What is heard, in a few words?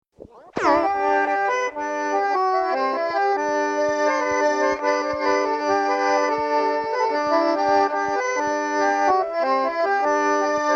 Accordion Tune Unnamed accordion